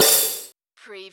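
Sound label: CARTOON CYMBAL CLASH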